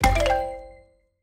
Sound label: explode lion multi